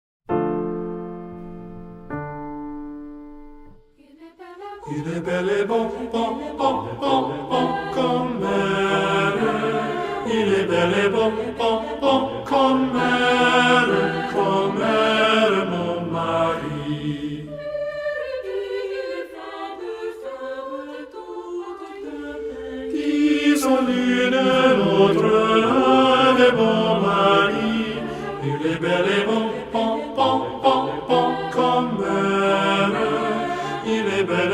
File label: tenor; Il est bel et bon